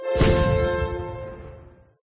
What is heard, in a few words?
game start; There; ThereClient; gamekit; gunkit